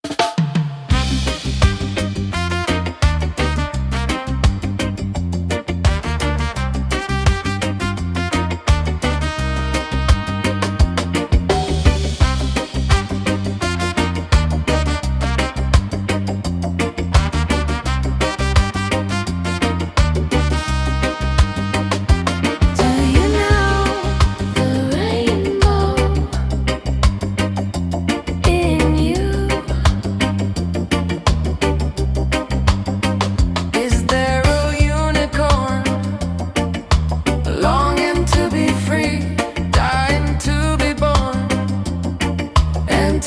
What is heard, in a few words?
Rainbow Reggae; Reggae at Play